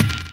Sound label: Feel Break